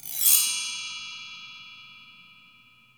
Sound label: bell tree; Percussion; Sonatina Symphonic Orchestra